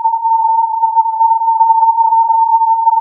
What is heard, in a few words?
filtered input